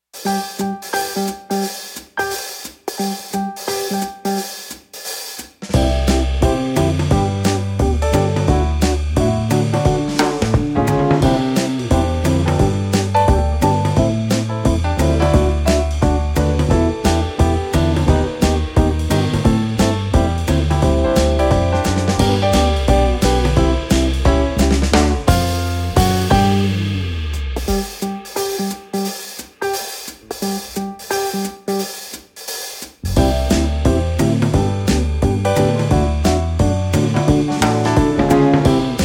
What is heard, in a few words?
instrumental